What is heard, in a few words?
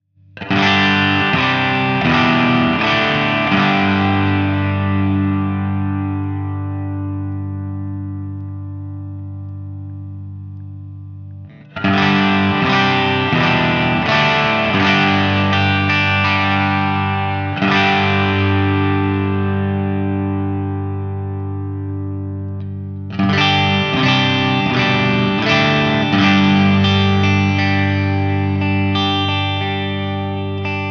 pickups; rocketfire p413 bsb52